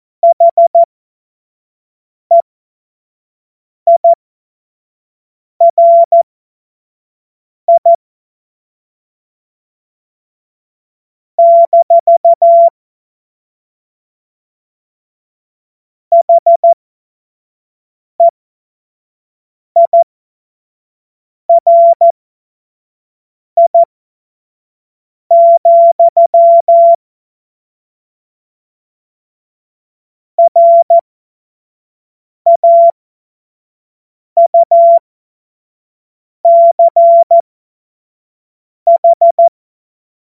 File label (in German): Witz, cw morsen; Tempo 25 F70